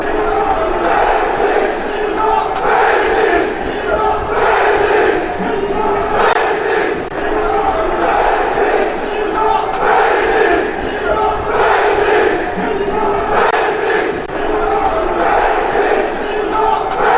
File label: chant, fanchants; club brugge